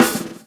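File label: drum roll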